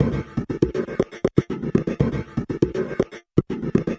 Electronic Percussion

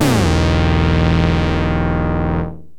sync low, yamaha rm1x synth bass and lead

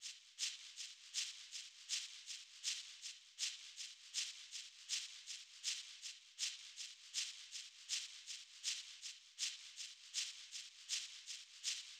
Acoustic Percussion

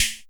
Snare One Shot, Drums

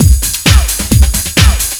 LOOP; SoundFactory; DRUMLOOP

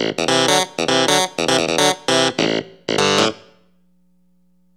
Electric Piano, Loops, Keys

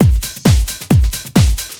loop; SoundFactory; SMPMANIA

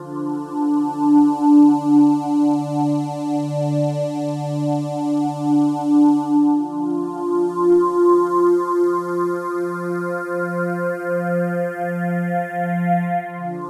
Pad, Synthesizers

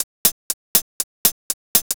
Hi-Hat Loop, Drums, Dance Beats

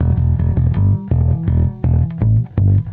Electric Bass; Basses

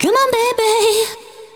vocals; SoundFactory; SMPMANIA

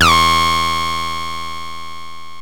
bl dist, yamaha rm1x synth bass and lead

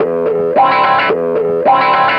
Electric Guitar, Loops; Guitars

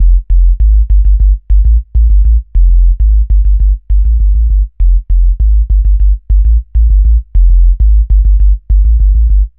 Synth Bass; Basses

